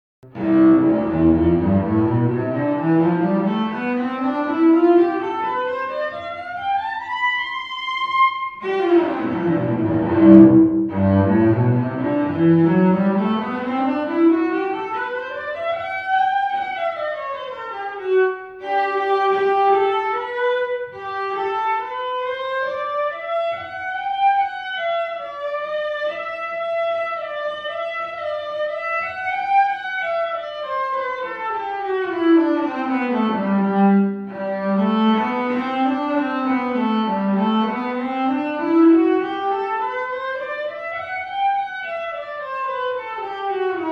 Cello Scales; Nancy Drew: Warnings at Waverly Academy

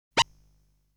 Enemy Damage; legend of hero tonma

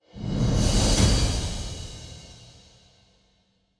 ui quest complete; Tyranny Gold Edition

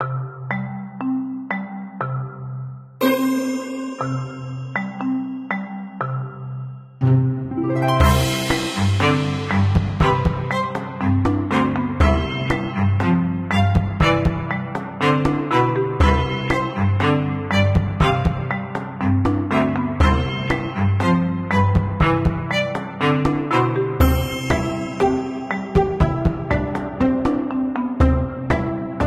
caves; Life Goes On: Done to Death

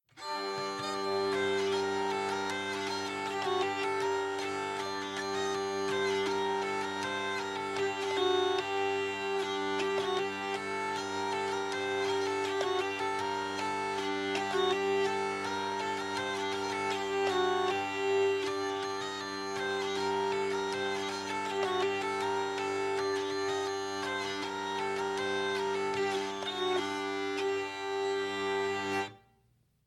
Tavern; assassin s creed unity gamerip 2014

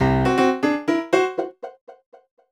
Jingle Fight

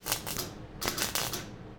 Welder Arc; Monster Truck Destruction; Android

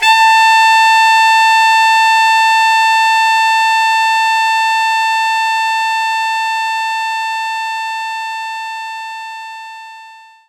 Alto Sax A5; knights try

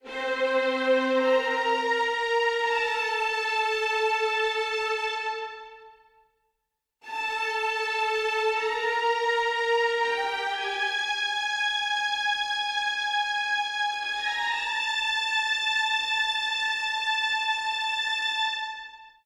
String; The Bureau XCOM Declassified

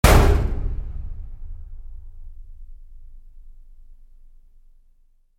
Vent Breaking; Infiltrating The Airship

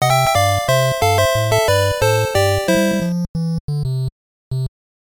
Won Match; Tennis; NES

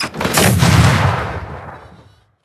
Goblin cannon Fire

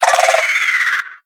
Swamp Jumpers Death; We Are the Dwarves

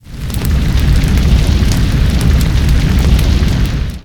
Burning; Zenonzard

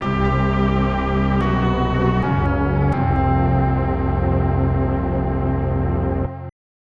Die; prince of persia snes

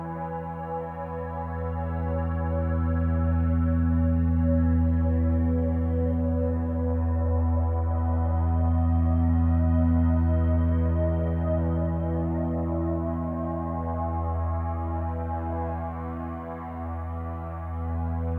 Christmas Ambience Pads; gamerip; Hot Lava

